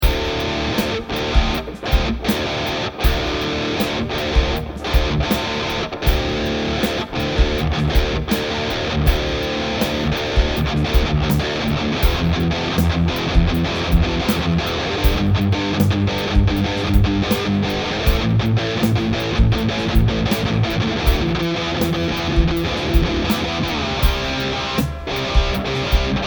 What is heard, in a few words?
Mod Hi Gain